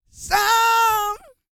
GOSPEL; Vocal Planet Vol1 Gospel

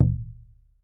PUEBLO DR, American Natives

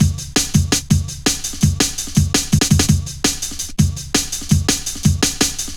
Fat Rolla, Jungle Drum Loops 166.5; Zero-G Jungle Warfare 1, 2 & 3

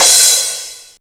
CYM CRASH; USB Soundscan vol.27; Ragga & Dub Reggae; AKAI; CYMBALS K

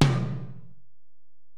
Tom, Alesis DM-PRO

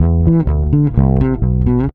REAL BASS, BASSLICKS; Ueberschall; Jam Box

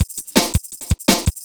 speedfunk; Sonic Foundry (Sony Creative Software); Ugly Remnants VOL-2

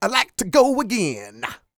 SING, Vocal Planet R&B & Dance; ILIO

